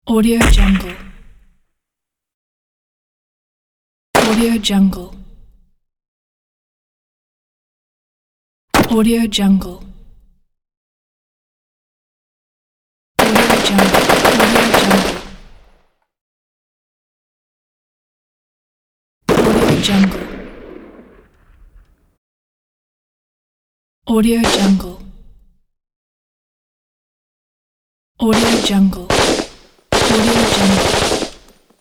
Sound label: gunshots; game sounds